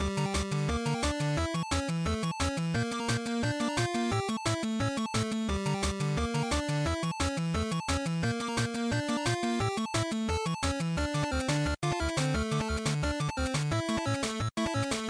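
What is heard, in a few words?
Game Boy Action Boss Loop, bgm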